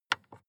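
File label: sports car interior volume button press; verkehr transport; autos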